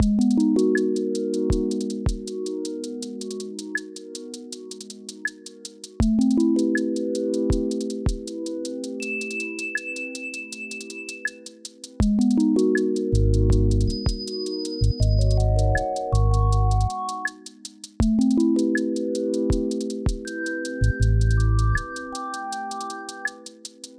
loop; pseudOs

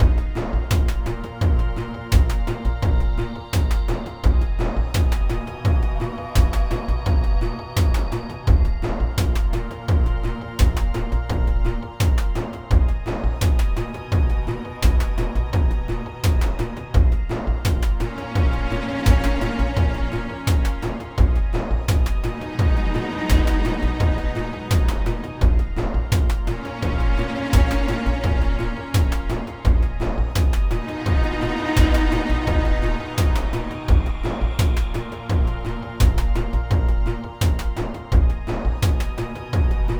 combat

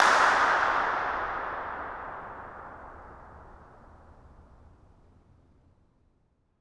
incinerator dirac

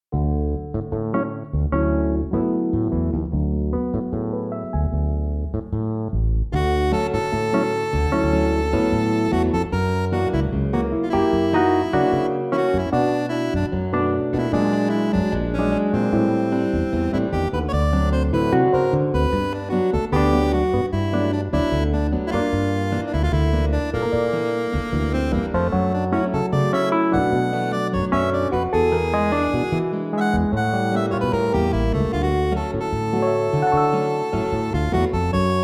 My Saxophone; costume 16 pièces

